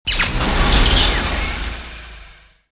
drill start; movers objects

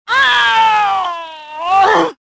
death; tavion

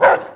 pitbull bark; animals; Tony Hawk's Project 8; XBOX

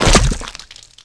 hit mud; hits; Tony Hawk's Project 8; XBOX

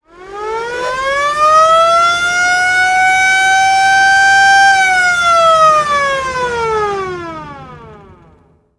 End Horn; Totaled; XBOX

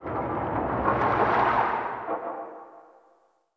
Bridge Down; Myst III Exile

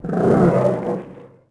roar, creatures; bantha; Star Wars Obi-Wan; XBOX

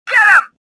anger; worker1

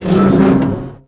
limp; weapons; galak

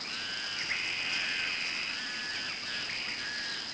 jungle; Ambient; MX vs ATV Unleashed; XBOX

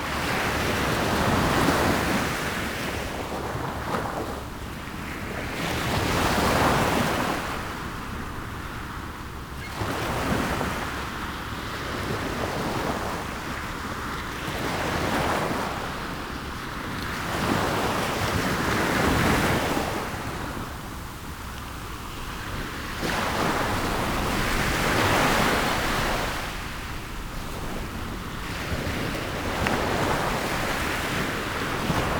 Amb Ocean Gulls; Bruce Lee Quest Of The Dragon; XBOX

